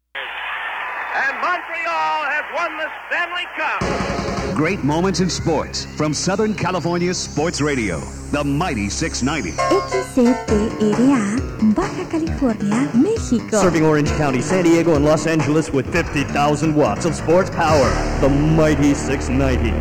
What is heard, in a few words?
Sports Radio Intro Track; San Diego; sports cast segments